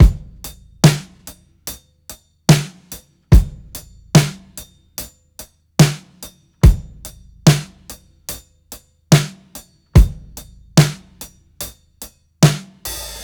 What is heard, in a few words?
FAT 145 8TH BEAT, DRUMS LOOPS; BASEMENT DRUMS VOL 2